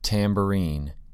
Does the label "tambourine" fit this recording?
Yes